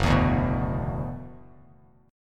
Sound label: F sharp 7th Suspended 4th Sharp 5th, Bass Standard; F#7sus4#5 strummed